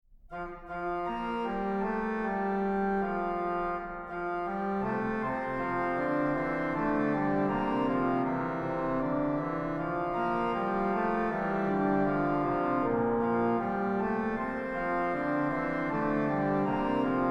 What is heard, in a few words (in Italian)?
fughetta; Fughetta sopra Lætare Jerusalem (2020)